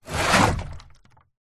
industrial 10 litre plastic drum water heavy slide